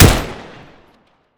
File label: vhs; garrysmod